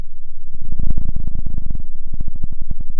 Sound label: pop, Sound of a cat purring